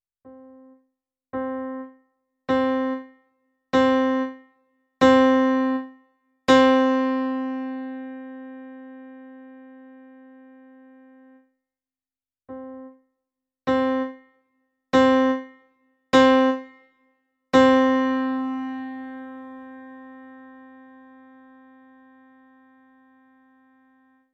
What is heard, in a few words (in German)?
Anschlag Piano; CLP470